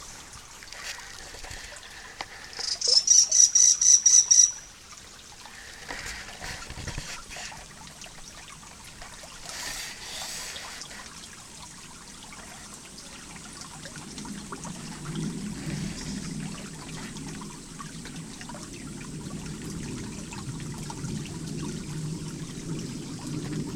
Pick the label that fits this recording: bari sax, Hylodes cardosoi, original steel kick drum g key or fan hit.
Hylodes cardosoi